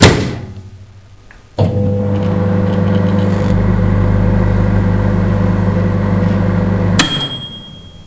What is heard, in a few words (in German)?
mikrowelle